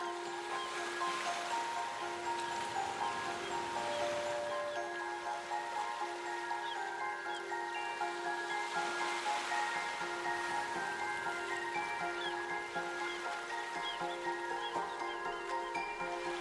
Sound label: ambience Feature Splash